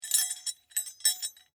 Skeleton Key Move, Foley, Skeleton, Key, Large, Movement; Jangling; Objects; BlastWave